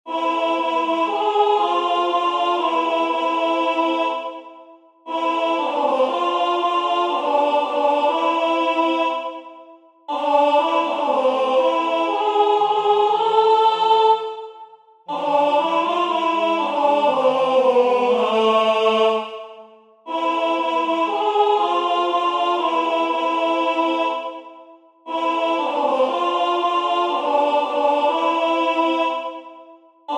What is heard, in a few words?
alto; Waiheke Island Choral Society